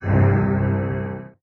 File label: dark ominous, String